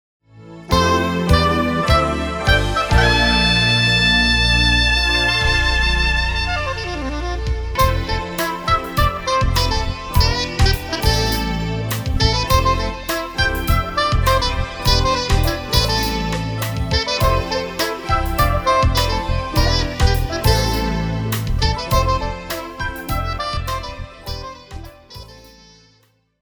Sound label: sax; saxophone